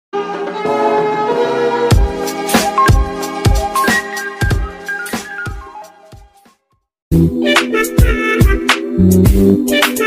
instrumental remix ringtone

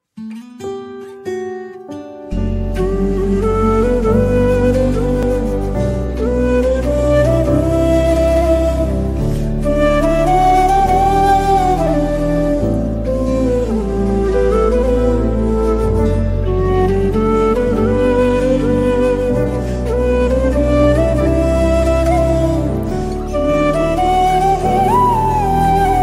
bgm sad ringtone

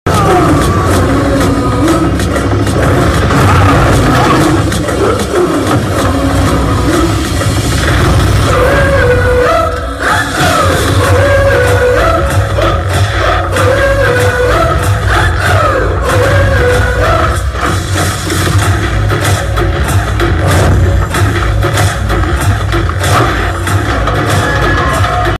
hero entry bgm ringtone; Vettaiyan movie; Rajinikanth entry ringtone

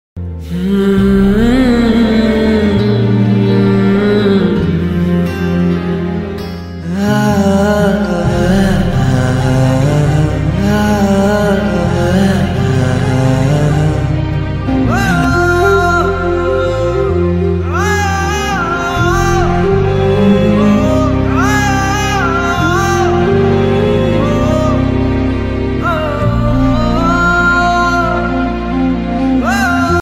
sad emotional bgm; darbar; thalaiver; nivetha thomas; rajinikanth

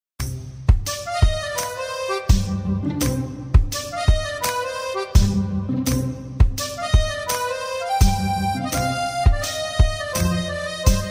south indian bgm ringtone tamil ringtone love bgm

